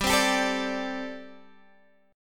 G Suspended 2nd Suspended 4th, Guitar, Gsus2sus4 chord; strummed; x,10,10,12,10,10